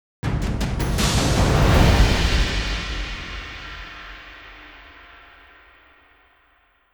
Combat Outro; The Witcher 3 Wild Hunt; Toussaint; Beauclair Castle

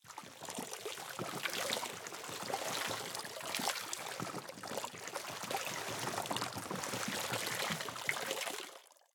Pour Bleach; The Inn; Onsen

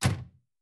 Foley car door

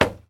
Metal soft

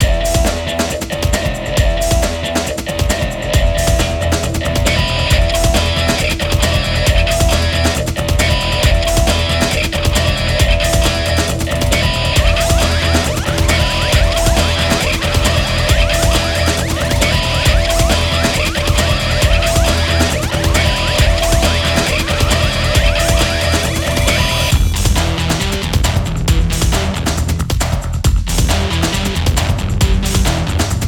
Race Lose; Racing; lego universe macos windows gamerip 2010

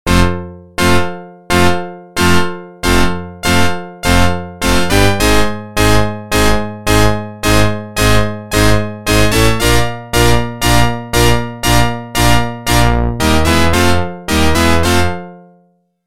Organ; NHL 94

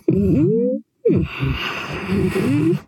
inspect

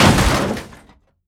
Car Damage Small

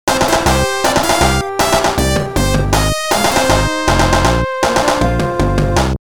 Song - Options (loop); donovanni the vanni ver .4.2 2021

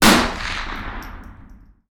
Tactics Rifle Shot; This Is the Police 2

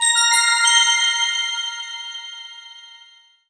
Start Jingle; Kirby's Extra Epic Yarn

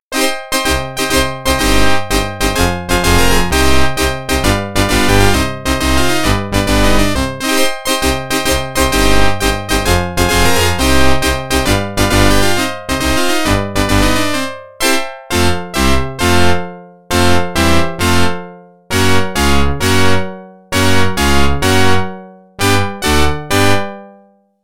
Organ; NHL 94

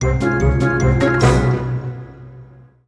Exiting Vehicle; the simpsons hit run; ps2

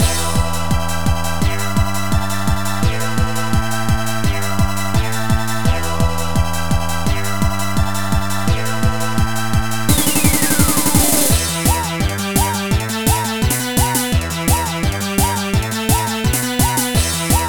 Para-Para Stage Karaoke; pinky street kira kira music night pop town gamerip

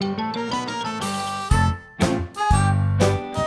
strolling music intro; Sesame Street Ready Set Grover

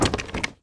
Grab item; In the Line of Duty Firefighter

